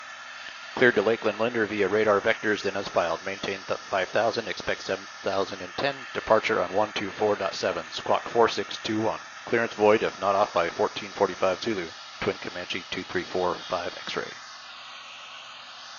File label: Piper Twin Bose A30; Zulu 4; sound comparison